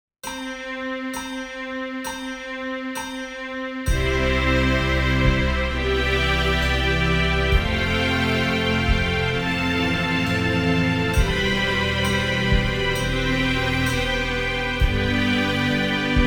Exercise

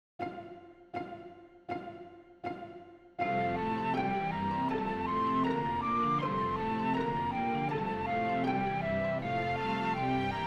Up and Down the Ladder Horns in Fifths

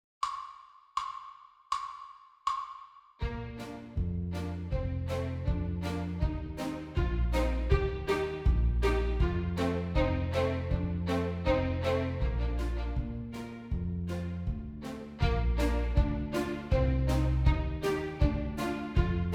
Fun with Thirds

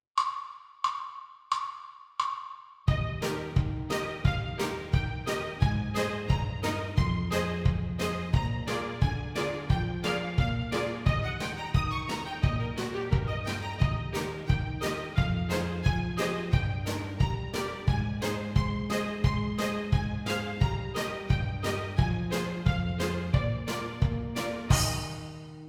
Concert Eb with Thirds

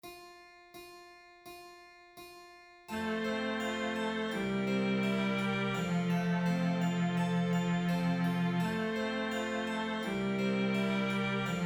Duet Horns in Fifths; Hot Bundt Cakes

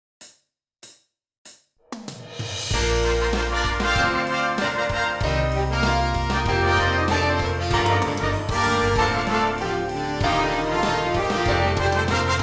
Concert Bb Scale

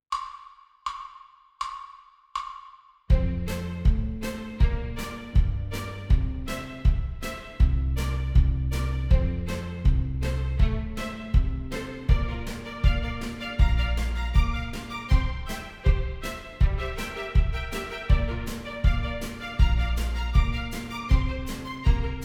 Horns in Fifths

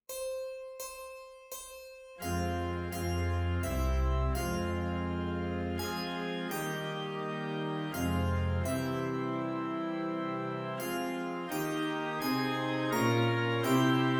Renaissance Tune

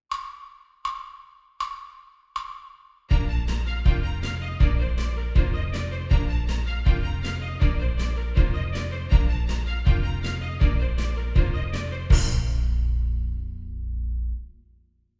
Advanced Daily Warm up F

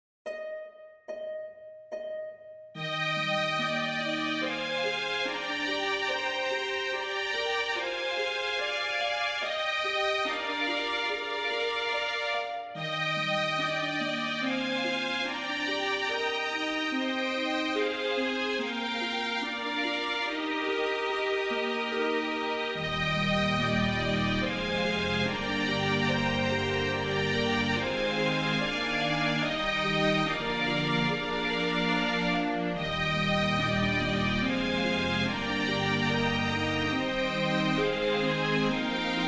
Chorale Tune

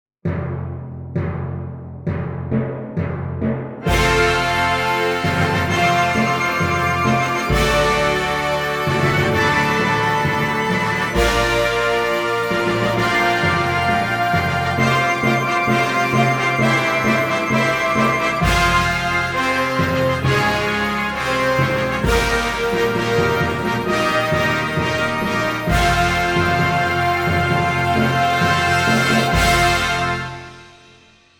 New Note C Horns in Fifths

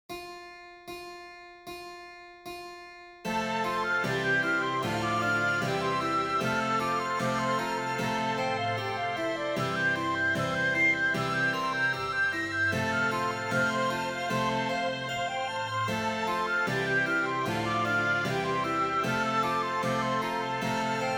Horns in Fifths

